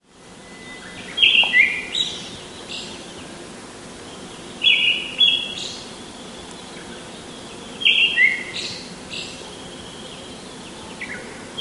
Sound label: Turdus pallidus; 白腹鶇